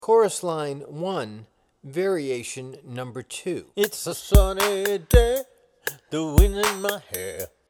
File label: Chorus Line Variation